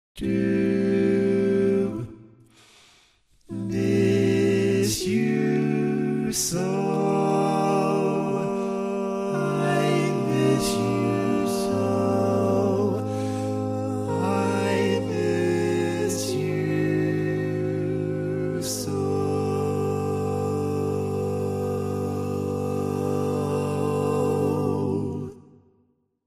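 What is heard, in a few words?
Baritone, barbershop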